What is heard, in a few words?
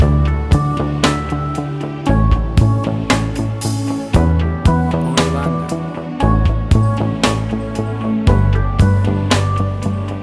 A New Electric World